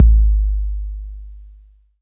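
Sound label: bass drum 808 Tape Decay E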